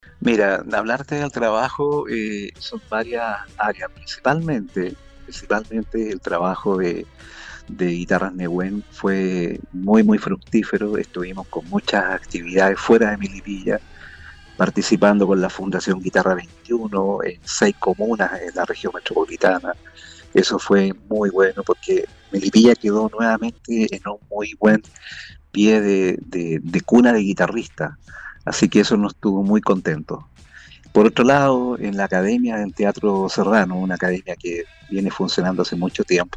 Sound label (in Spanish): GUITARRAS EN NAVIDAD; tradicional concierto guitarras de navidad en el teatro serrano